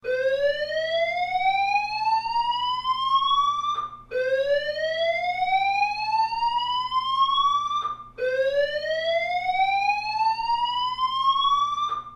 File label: alarm staff